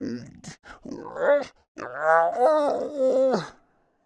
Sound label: tiny monster pain; the library by mtc; Sonniss GDC